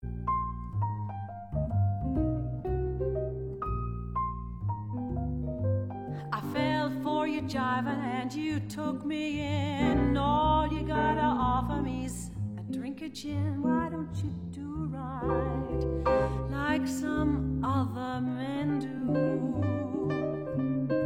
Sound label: Jazz, Quintet